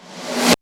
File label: SNARE REV; Back In Time Records - Big Bang